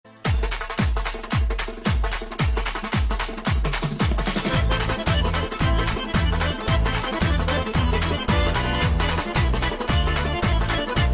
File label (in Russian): novaya lezginka